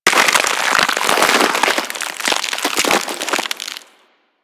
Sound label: Freeze Ray Frozen; Warframe; Weapons; Corpus